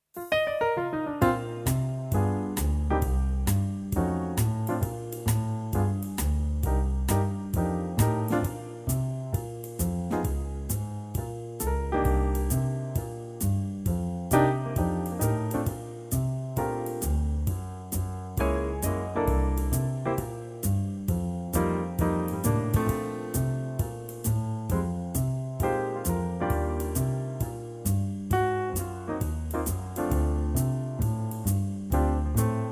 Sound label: Bb swing; key - Bb; vocal range - F to Bb; Cool Livetrak trio version